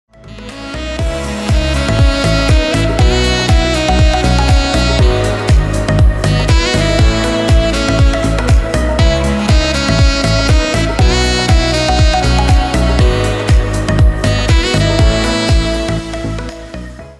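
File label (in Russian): саксофон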